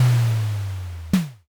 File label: castle gate